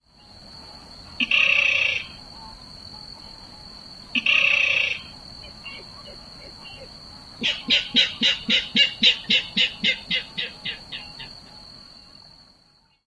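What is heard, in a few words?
k-kerrr call and grunting outburst, bird; NC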